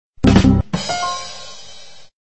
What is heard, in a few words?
badumtss windows